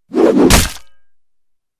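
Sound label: tomahawk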